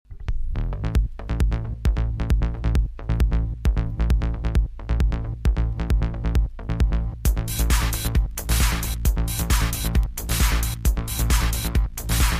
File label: electronic